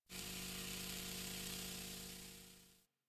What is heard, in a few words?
Melee Slam Smoke, Impacts, Ground Stab; Warframe